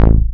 Bass A, Offbeat Bass; VEC1; Club

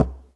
Knock

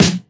Snare; VEC1

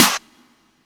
Snare; Takebeat; Club; VEC1

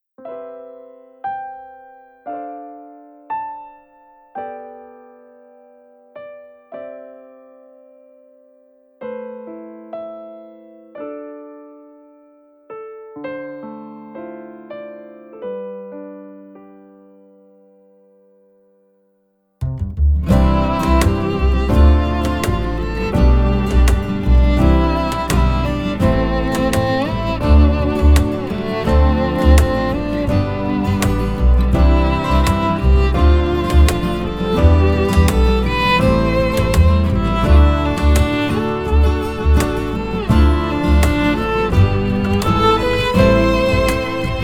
Violin; Boys over flowers 2 OSTs

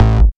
Bass A, Offbeat Bass; VEC1; Club

